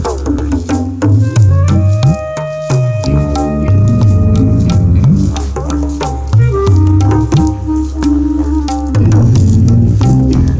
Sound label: RHYTH, LOOPS